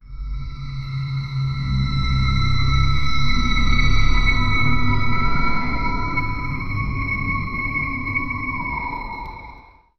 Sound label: kind of short and creepy, circus